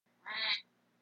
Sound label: Quack; Interrupting